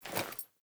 Foley Action Default Melee Swipe, Foley Default Clothing; Characters; Player